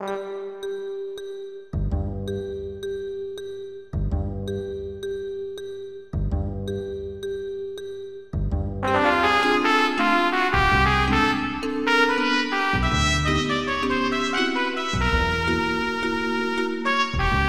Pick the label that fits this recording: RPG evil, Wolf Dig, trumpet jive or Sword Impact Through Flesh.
trumpet jive